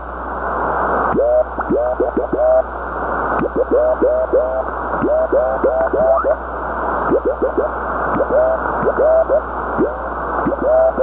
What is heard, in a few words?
40m Hartley; vintage; k4jys; 29 hartley; k4oah